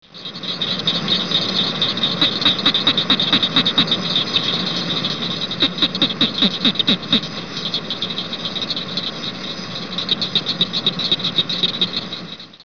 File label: southern cricket; frogs toads; wildlife